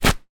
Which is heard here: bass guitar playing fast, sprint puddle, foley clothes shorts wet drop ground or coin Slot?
foley clothes shorts wet drop ground